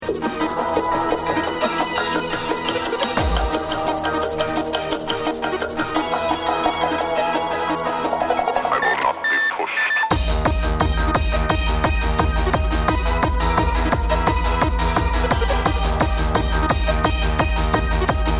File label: Noise & Music